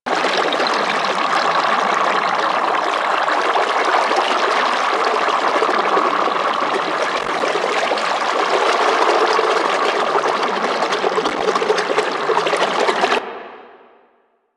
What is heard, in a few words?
Sharkwing Movement Bubble Loop, Vehicles; Warframe